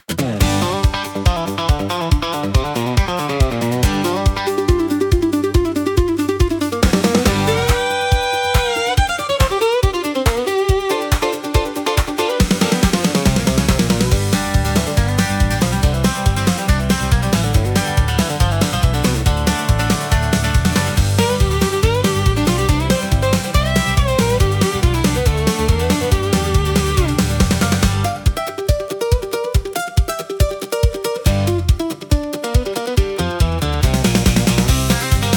Dust on the Gravel Road, country acoustic for nature and refreshing